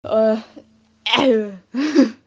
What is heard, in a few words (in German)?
klingelton